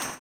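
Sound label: tambourine, 160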